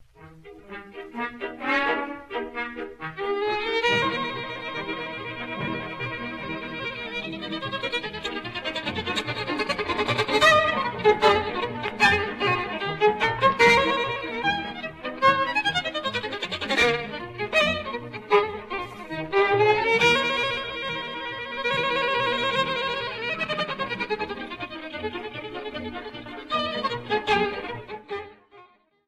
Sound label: Hora Staccato, Violin, LIVE RECORDINGS; DINICU-HEIFETZ